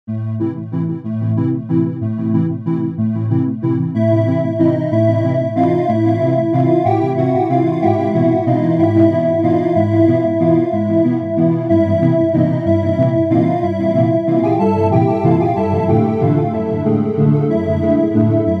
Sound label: Hollow Pad